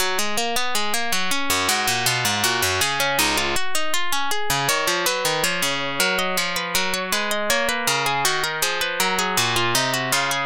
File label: invention, electric duet